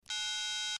Telephone Cell Phone

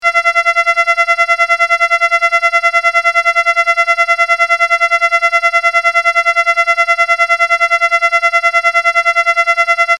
Scanner Pulsing Warb, drones

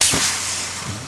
Future Gun Shot Sing, futuristic

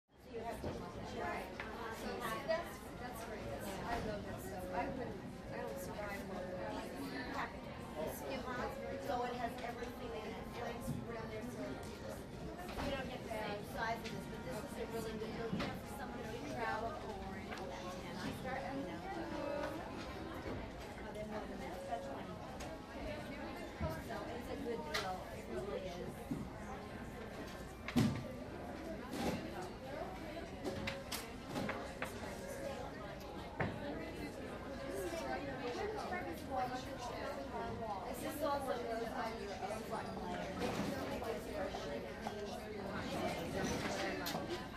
Department Store

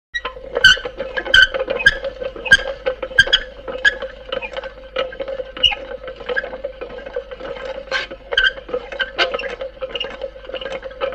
Grinding Wheel Hand, grinders

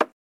Card Table, cards

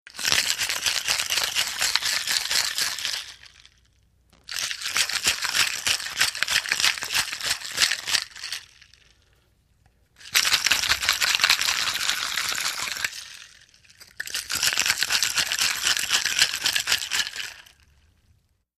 Cocktail Shaker Ice

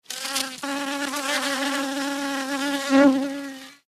Fly CU Buzzing, flies

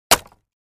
Axe Wood Crate Palett

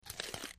Juicy Crunchy Bites V, bone crush

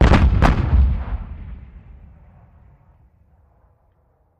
105mm Howitzer: Fires, Close-up. Dual

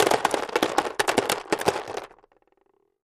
Landscape Rock Plast, rocks & dirt

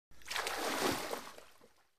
Swimming; Swimmer Getting Out Of Pull.

